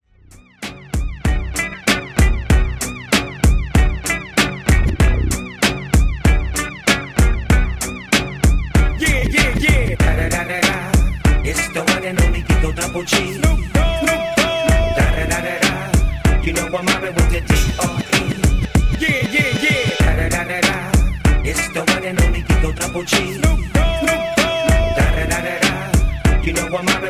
In Outro 96 Bpm Dirty; djyan

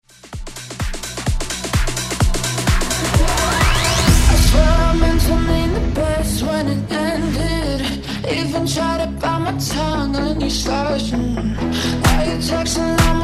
EDM

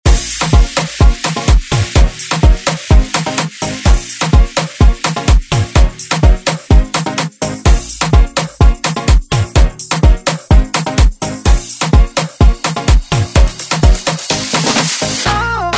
Afrobeat Redrum; remixplanet

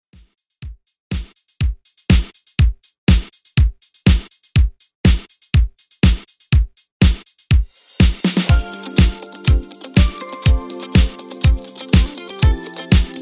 Redrum 122 Bpm

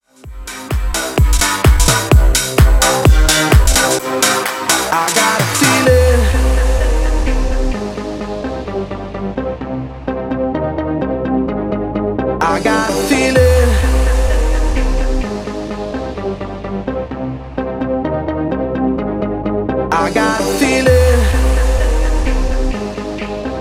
festival, 128 bpm; Hook Festival Edit

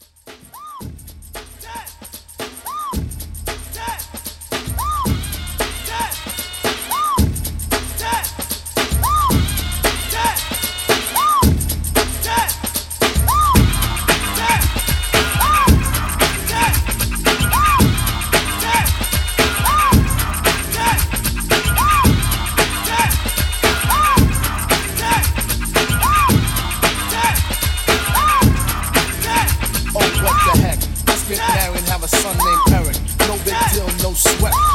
90s Hiphop Old School

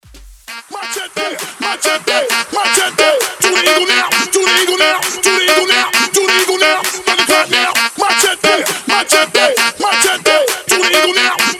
Hype Edit Tribal Afro, 132 BPM; Fatman

